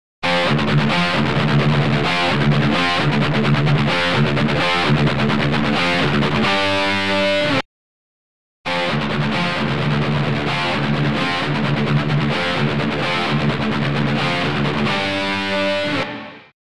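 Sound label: Guitar Studer Wet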